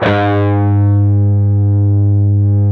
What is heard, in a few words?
PRS PWR A2D, Guitars; PRS PWR CUTS